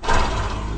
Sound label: horse grunt; minecraft; technic